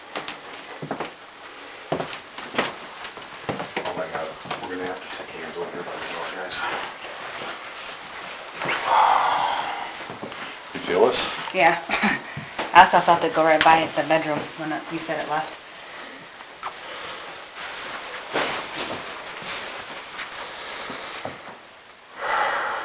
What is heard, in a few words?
phone